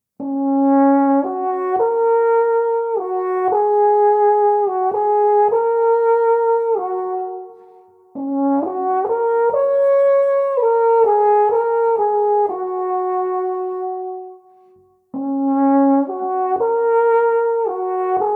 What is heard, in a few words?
alphorn